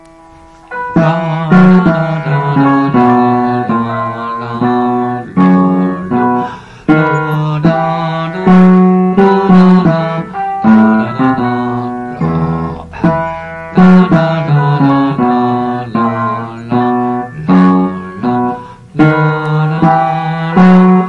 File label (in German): Wir essen BASSE; chorale